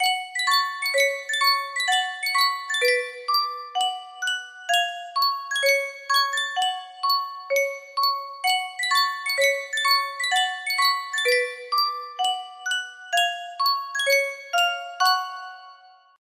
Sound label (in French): yunsheng boite a musique